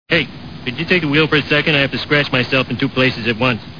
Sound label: scratch; homer